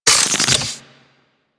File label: Sidearm Reload, Weapons; Warframe; Tenno; Wraith Sidearm